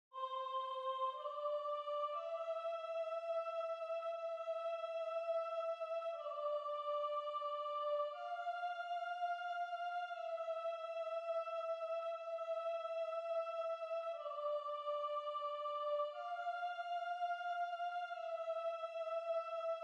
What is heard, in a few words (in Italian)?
piano Soprano